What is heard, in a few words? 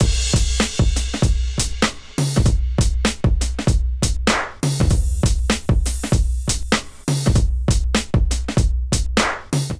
drums basic; MIDI Clips; Move Up 104; Ableton; Factory Packs; Chop and Swing